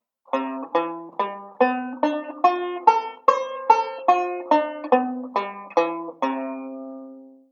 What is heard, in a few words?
Modal Arpeggio, banjo; Beyond Chord Melody Volume II